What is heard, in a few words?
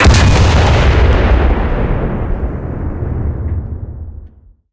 cannon 150mm type38 shot